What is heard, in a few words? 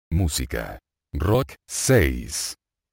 rock